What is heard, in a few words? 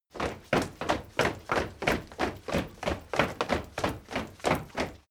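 step crowd run loud sequence, footsteps; schritte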